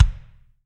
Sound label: BD, DR BD; Best Service Gigapack 2